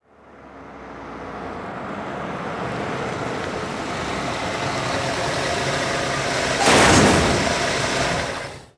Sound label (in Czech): naraz au; Mafie 1